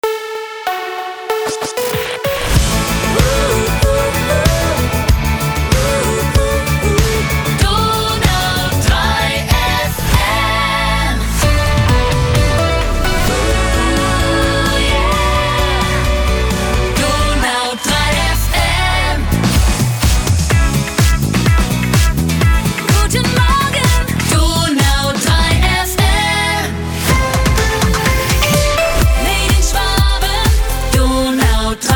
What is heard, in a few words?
Jingles; DONAU3FM